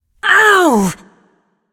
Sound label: ouch; smite; voicepack; persephone